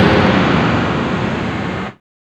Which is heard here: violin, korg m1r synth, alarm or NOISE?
NOISE